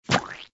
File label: create toon art; GUI